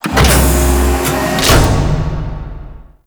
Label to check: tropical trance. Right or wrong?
Wrong